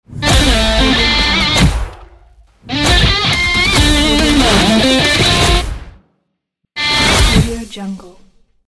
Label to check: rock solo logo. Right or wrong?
Right